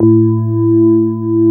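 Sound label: CX3 organ A2 L, Keyboards Old School; USB Soundscan vol.09 - Keyboards Old School; AKAI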